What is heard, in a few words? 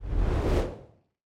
ui arena spell draw end